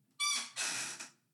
Creaking Door

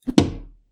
Chopping Wood

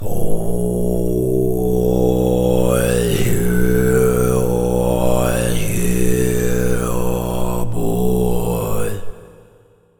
Low Chant Reverb

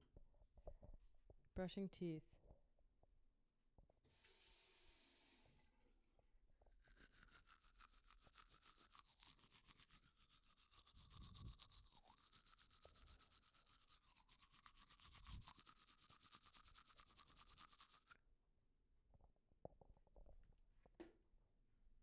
Brushing Teeth

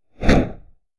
Parachute Poof Simulation Pseudostereo

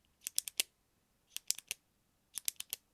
Retract pen

